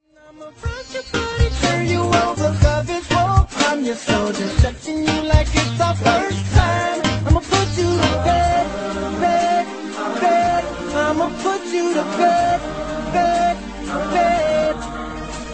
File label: reggae remix